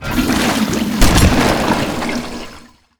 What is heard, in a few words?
taunt; dota; morphling